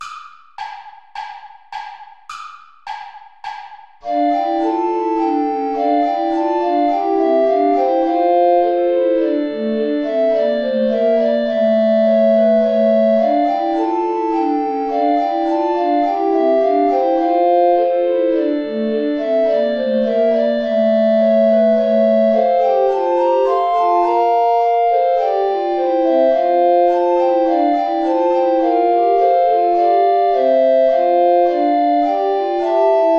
play along without soprano recorder; bach johann sebastian; beside thy cradle here stand

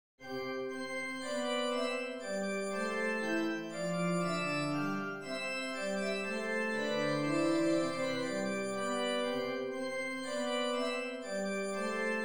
passepied

